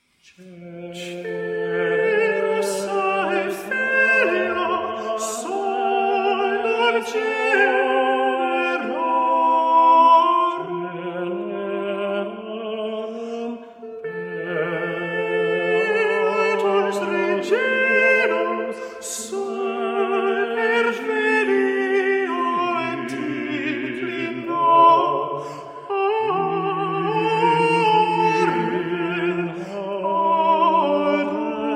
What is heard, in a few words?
Singing; Rosslyn Cube